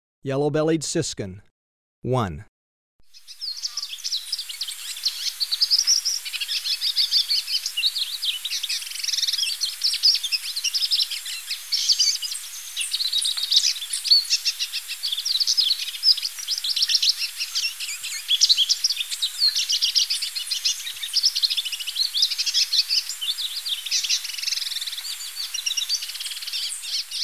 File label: Yellow-bellied Siskin, Birds of Ecuador; S. America